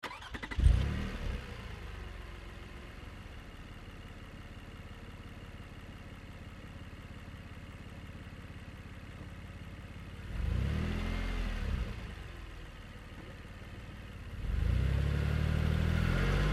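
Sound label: Car Highlander Outboard Drive Fast; 2005 Toyota Highlander V6; Cars; Vehicles; Big Room Sound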